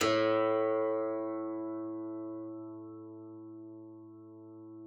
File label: KCYM A2, KLAVICYMB; Xsample VOL-8